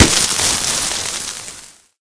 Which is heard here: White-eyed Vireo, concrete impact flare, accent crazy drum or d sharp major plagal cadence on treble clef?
concrete impact flare